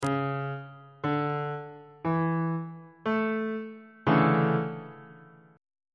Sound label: c double sharp major 7th suspended 2nd 3rd inversion